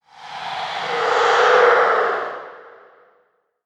Vocal Enemy Spotted; Enemies; Warframe; PriestQuest; Rell Specter

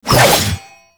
Bayonet Melee Spin Fast; Warframe; Tenno

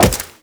Wall Jump; Warframe; Tenno; Wall Run

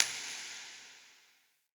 Coin Toss Flick; Level Events; Midrath

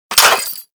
Fire Sweetner, Weapons; Gorgon

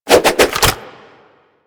Nunchaku Quick Melee Return; Weapons; Warframe; Tenno

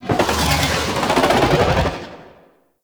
Baby Dragon Vocal Idle; Warframe; Duviri; Void Dragon

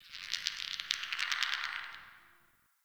Magnet Chatter; Ambience; Warframe; Sentient Lair

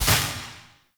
Orb Cannon Rolling Destroy

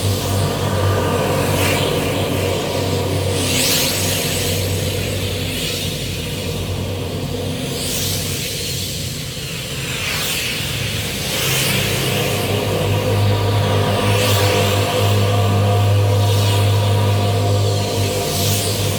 magic mist loop; Ambience; Props; Warframe; TheNewWar; Zariman

